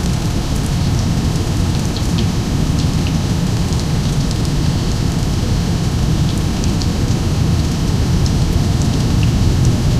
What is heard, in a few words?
dreary rainstorm